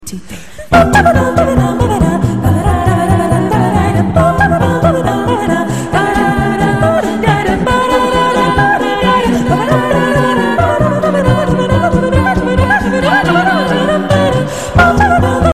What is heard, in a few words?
badinerie swingers; Badinerie - J.S. Bach